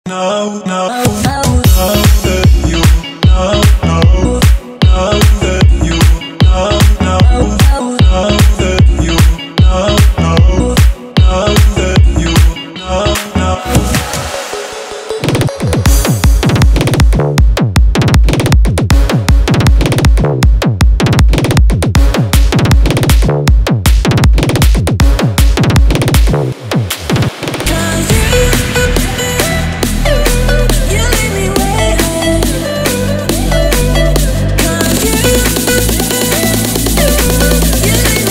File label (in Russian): ускоренная музыка, speed up music